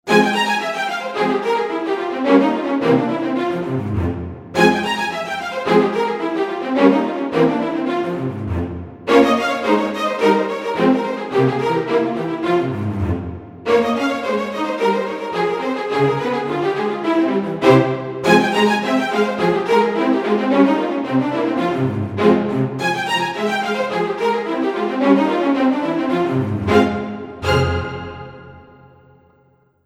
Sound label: Strings